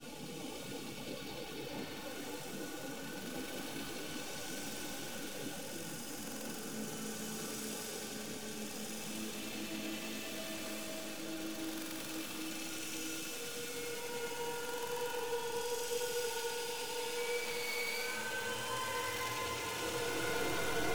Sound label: virtual string